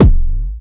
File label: dry kicks; Quasimidi Rave-o-lution 309